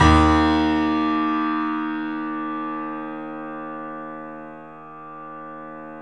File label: Small Pianos, 3-D Audio Collection